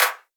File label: perc; Anthemic.Electro; drum hits